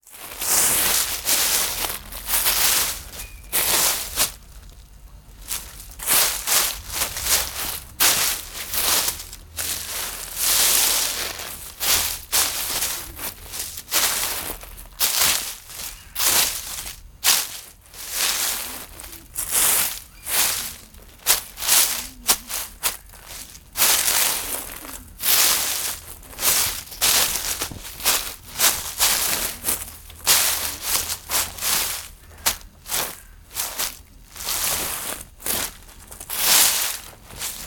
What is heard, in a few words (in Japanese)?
枯れ葉を手でかき分ける音